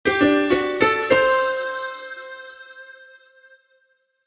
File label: Ringtone, BlackBerry 9000 Bold